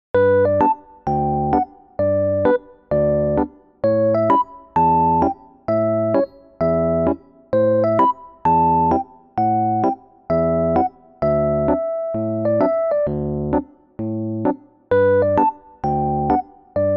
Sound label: Newage